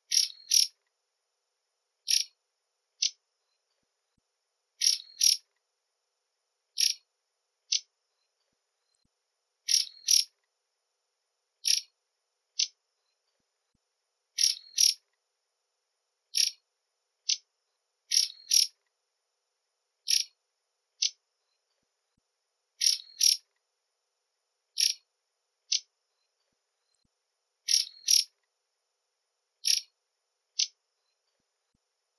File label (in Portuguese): Canto do beija flor de topete